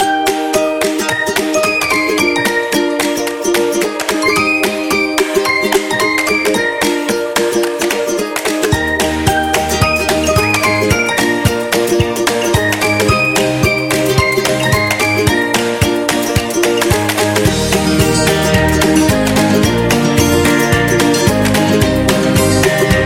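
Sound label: Fun Happy, Upbeat and happy background music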